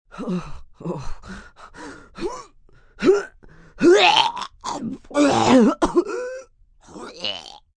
Vomit Heaving, Vomitting, female, heaving, coughing; Vomiting; Human; (human voice)